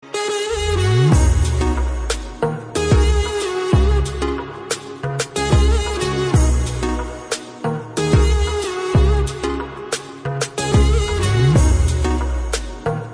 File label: Chill ting